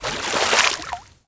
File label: swim water surface